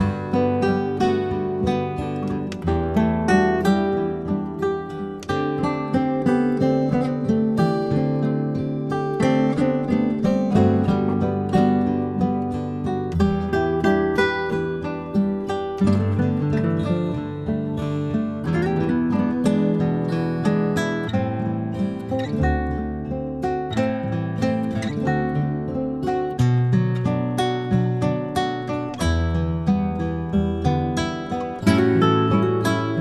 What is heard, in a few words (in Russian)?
Muzyka dlya sna, Zvuki Rasslabitsya